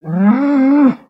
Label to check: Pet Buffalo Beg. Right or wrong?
Right